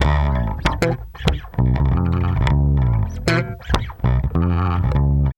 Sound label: BASS, Funky Ass Loops